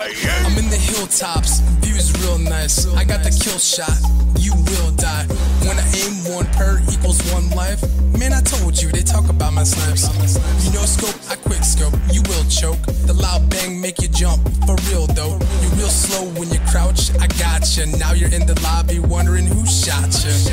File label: rap